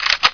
gun pickup

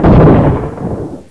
explode muffled

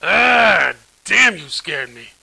alert

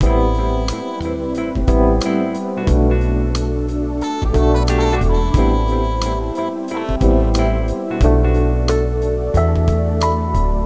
jazz

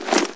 bag toss

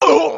MINOR PAIN; cash

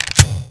gun pickup

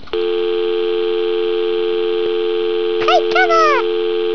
phone cover

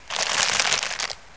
appear

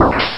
hurt

